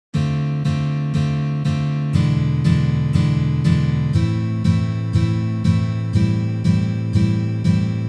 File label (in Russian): Uprazhnenie dlya igry akkorda Dm7